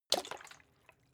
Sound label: bottle water upend, gastronomie; berufe